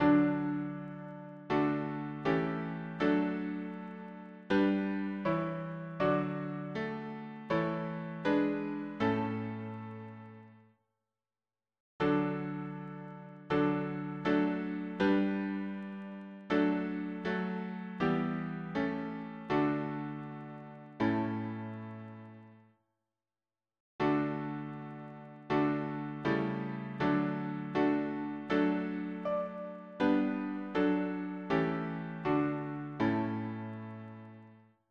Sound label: harmony piano